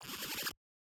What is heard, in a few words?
laser charge; battle; CrossCode